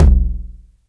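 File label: 909 low tom tape; Toms; Goldbaby Tape808 & Tape909; The Tape 909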